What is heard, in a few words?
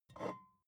bottle vodka pick up slide soft; berufe; gastronomie